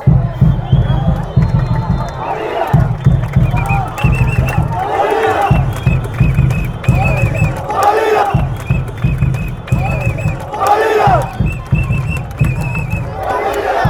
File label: bolivia whistles fanchants